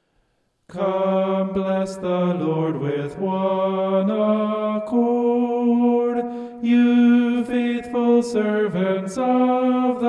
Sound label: vocal homoph; psalm 134